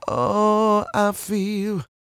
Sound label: CROON, Vocal Blues & Soul MELLOW